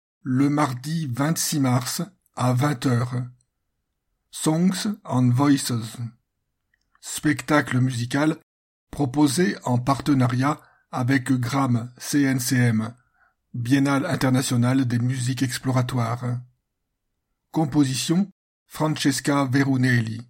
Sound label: songs voices